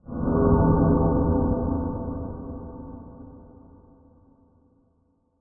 metal hit low; fx; PC Gamer IT CD 40; SMACDEMO

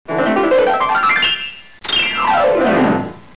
piano slides

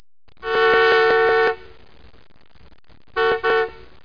car horn

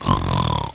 SNORE IN

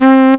saxophone; Sound Effects for Multimedia - Volume 1; horn instruments

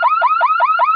BURG ALRM

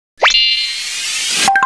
Robot; Strategy Challenges Collection; cosmicg

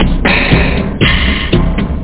rytm; gamesencyclopedia1995

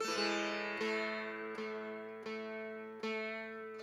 SITAR ETHNO 125 A, Guitar; eJay

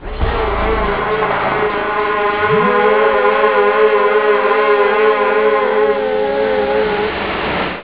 stabs

